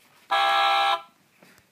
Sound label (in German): Soundbox hupen